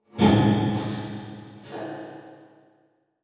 Transition Hit Impact Drone; Production Element

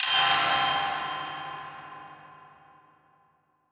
Transition Hit Impact; Production Element

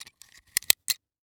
Industry Tool Box Cutter Extend Blade In

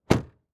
Transportation Jeep Commander Close Door Exterior

